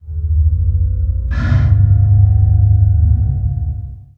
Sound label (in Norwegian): elektropust